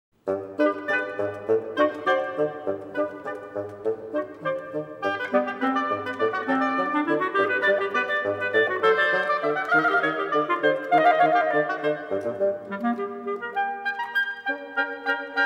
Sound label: Maple Leaf Rag